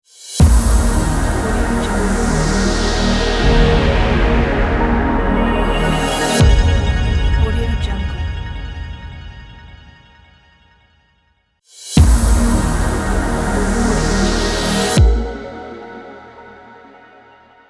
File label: science and technology logo